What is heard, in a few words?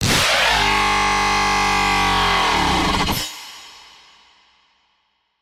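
abrupt bass sound; d3strx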